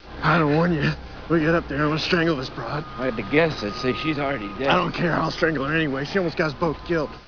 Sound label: strangle